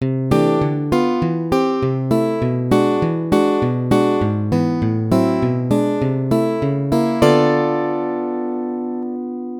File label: walking bass